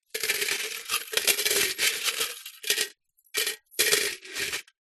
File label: money coins inside metal tin hand inside movements; foley